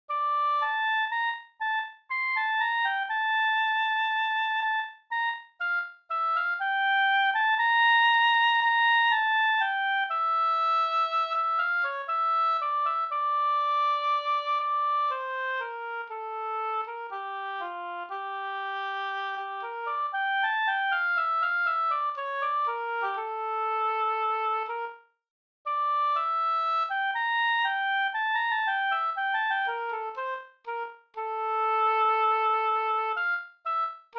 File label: Oboe